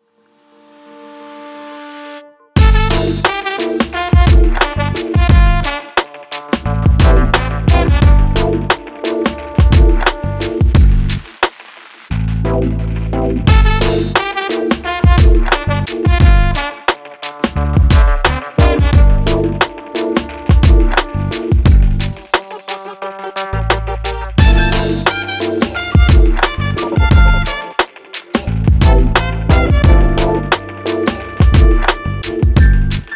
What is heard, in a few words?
jazz lounge hip hop